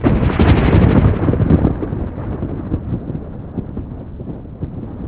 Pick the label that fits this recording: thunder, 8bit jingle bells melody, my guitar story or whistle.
thunder